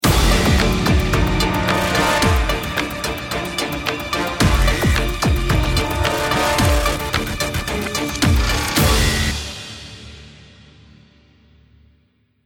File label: bomb ten sec count